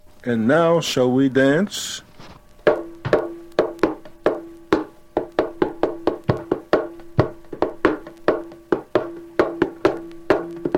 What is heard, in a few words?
rhythm for mime with east indian harmonium; banjo